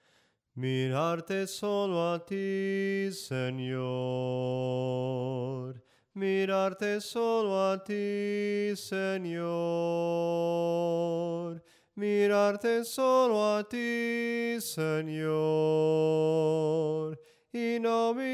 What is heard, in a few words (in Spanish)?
SOPRANO CANTADO; cantos del camino